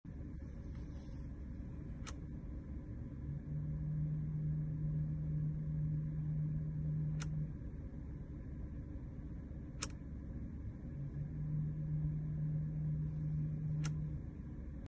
when i turn my lights weird sound appears, c class w206